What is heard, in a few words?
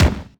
march Onbeat; HeavenStudio; lockstep